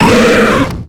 Grito de Muk

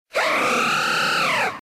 Grito de Xerneas

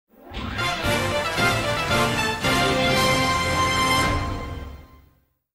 Tema de victoria; King K. Rool; SSBU

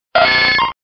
Grito de Nidorino

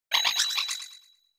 Grito de Indeedee hembra

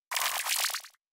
Grito de Pincurchin

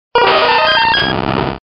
Grito de Mew

